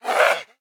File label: attack, zombie; DayZ Epoch